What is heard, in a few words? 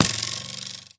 bow hit; minecraft pe 0.6.1